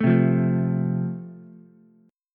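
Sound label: A Minor Sharp 5th, Ukulele, chord strum; Am#5 strummed; 2,0,1,0; JGuitar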